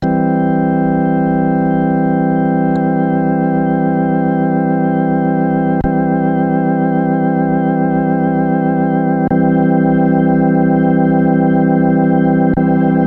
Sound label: Hammond SK1 Vibrato Chorus